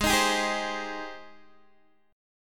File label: Ab Major 7th Ab down Guitar DADGAD